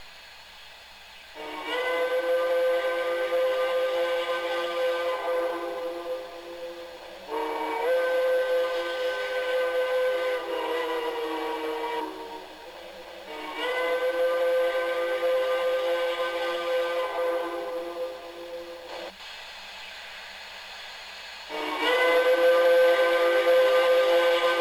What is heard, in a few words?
Steam Volume